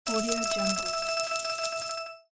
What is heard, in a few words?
slot machine win